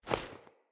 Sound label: Female Foley Action Default Melee Swipe